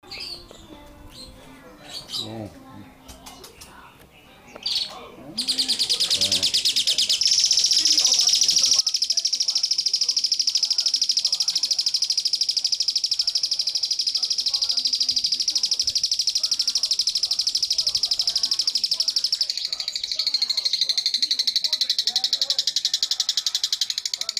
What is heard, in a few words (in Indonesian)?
Suara Jalak Suren Ngekek Panjang